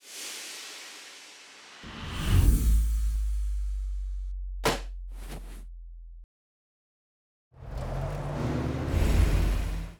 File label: SFX; Jeep Empire Outro